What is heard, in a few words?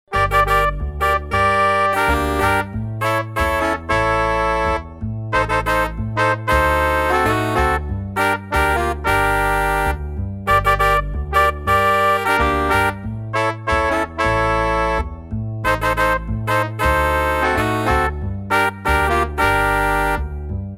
shout, minor-key agressive bossa; shout chorus